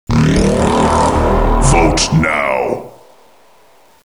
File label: vote start